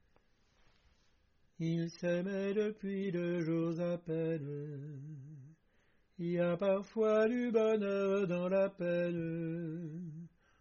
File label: soprano